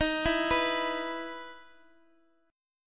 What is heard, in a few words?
E 5th Eb; E5/Eb arpeggiated; JGuitar